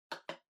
PC-Keyboard (Far-Space-Mid)

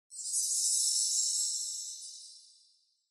Sparkle Chime Only

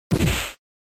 Fighting Hit

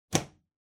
Wall Outlet Wall Plug In Hard

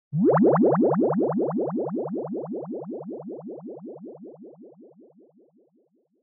Motion Pop

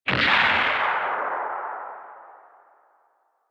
Retro Anime Gun High Long

